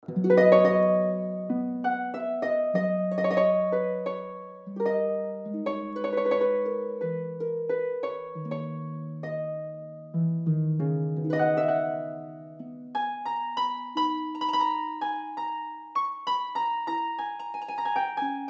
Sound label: history harp; classical; slow; carefree; harpe; old fashined atmosphere